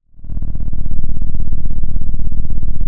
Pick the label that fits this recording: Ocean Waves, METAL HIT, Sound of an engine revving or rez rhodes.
Sound of an engine revving